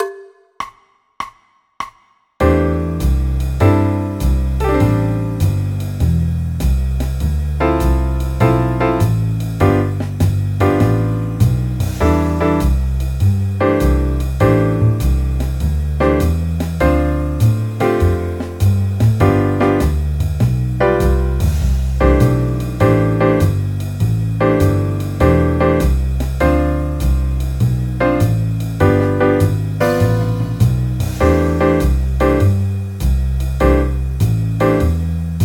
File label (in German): c dur akkordverbindungen